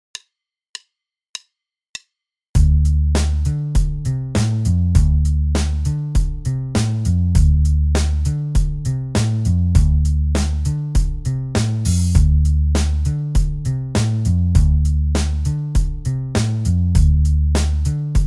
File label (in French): contretemps et syncopes binaires tempo 100